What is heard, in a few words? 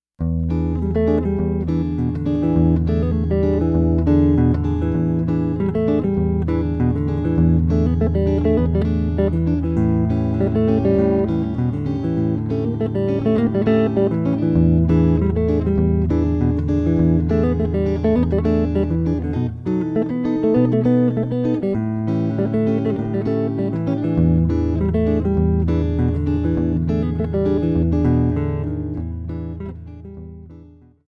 rhythm guitar